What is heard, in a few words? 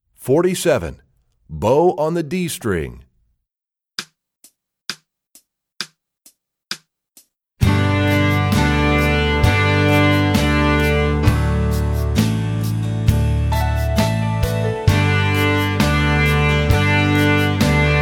Bow On The D String, Violin